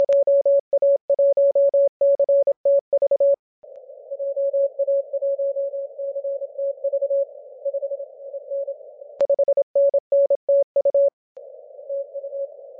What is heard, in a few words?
28mhz